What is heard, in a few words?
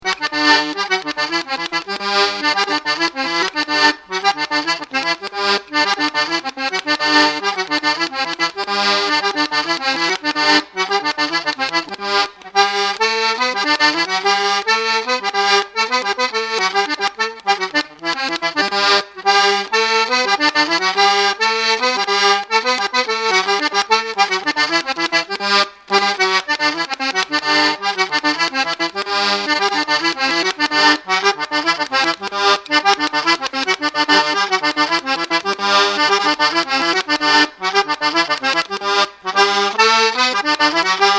Jig; The Black Rogue